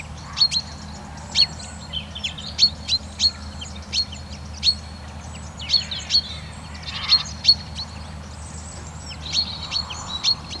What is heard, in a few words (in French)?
pinson des arbres cri